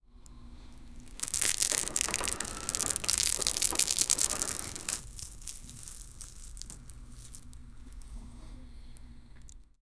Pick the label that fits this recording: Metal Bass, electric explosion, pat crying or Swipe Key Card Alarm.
pat crying